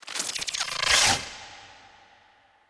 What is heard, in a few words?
Pack Rollup